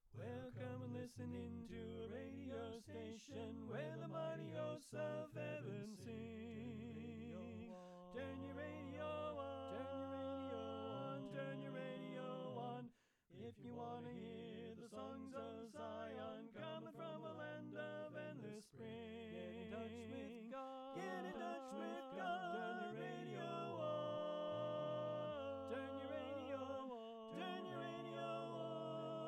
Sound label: Tenor Left; Pennsylvania Groups; NBC